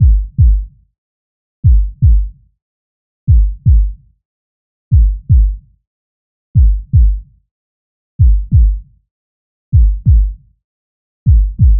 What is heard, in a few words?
Heartbeat,Sound Design,Pulse,Throb,Steady,Accelerate,Panic,Fear